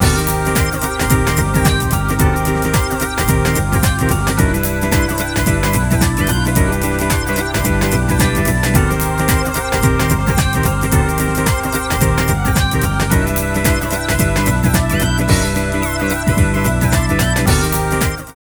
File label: Target - Menu; Monkey Target Menu